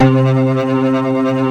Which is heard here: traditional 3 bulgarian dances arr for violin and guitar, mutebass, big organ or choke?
big organ